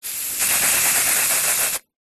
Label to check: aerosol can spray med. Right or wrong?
Right